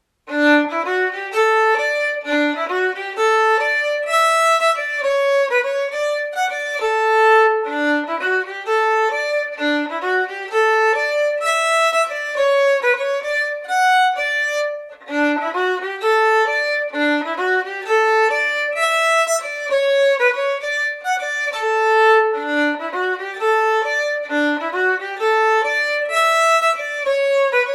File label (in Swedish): Schottis; eft. Gustav pa Sjobroten